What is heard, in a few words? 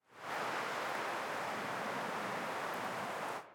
Victory Wind; Smite; Susano